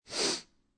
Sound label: Sniffing Nose, Human, Sniffing Nose, Single Nose Sniff; Sniffling